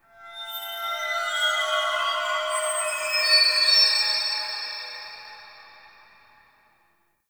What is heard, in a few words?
Magical Chimes Two, Root Cave; Ambience; Neathuns Root Cave; One Offs